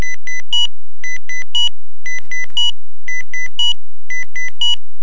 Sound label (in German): piepserl schleife